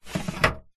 office plastic file drawer tray slide open or close